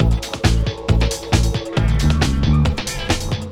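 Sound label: SAMMIE LOOP, Techno Trance; E-MU Formula 4000 Series Vol. 2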